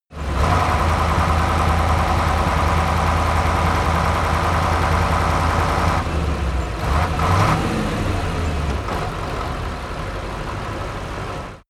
truck ural 4320 driving steady decelerate

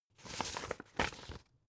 old examine

